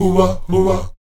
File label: O-WA F#2, Afro Chants, Oh-Wa Oh-Wa; Roland LCDP11 Africa VOL-1